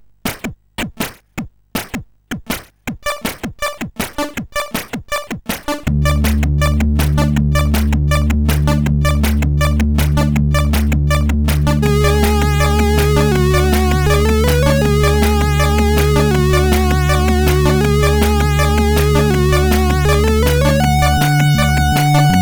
Song, sid CSG8580R5

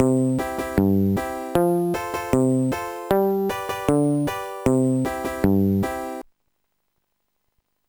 bass; Sonix Music Driver

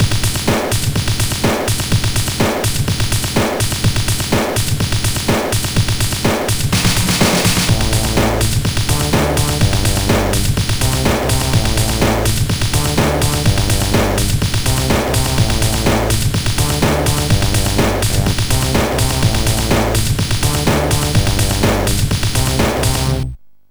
heavy; X-Beat Pro

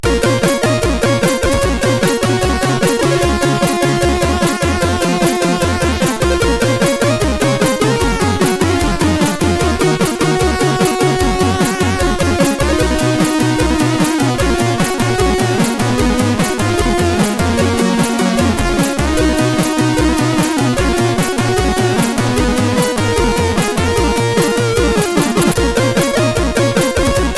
Hung Over, sid CSG8580R5

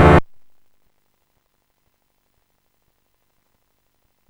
raspy; Sonix Music Driver

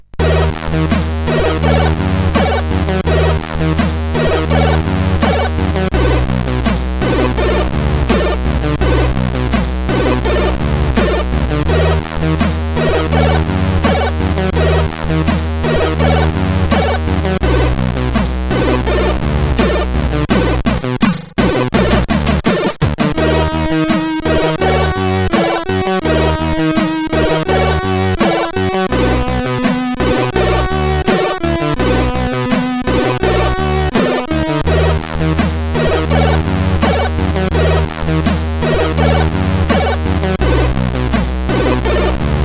Intro Tune One, CSG8580R5

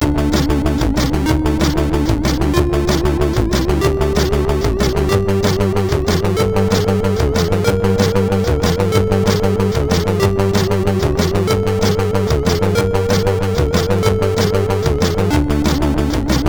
sid MOS6581R4

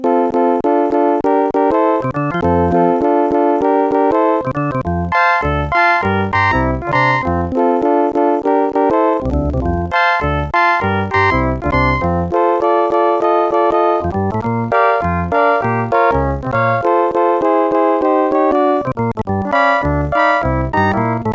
harmony; The Music Studio

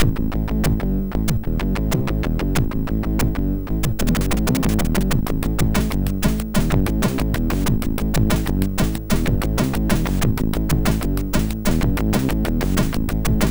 sid MOS6581R4; Introboost

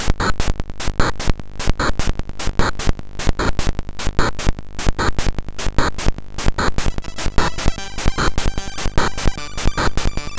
Tension Remix, MOS6581

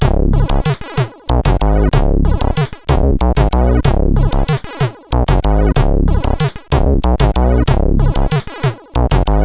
sid CSG8580R5

